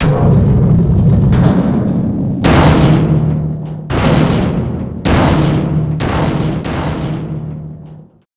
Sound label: Ball Roll; Finding Nemo; XBOX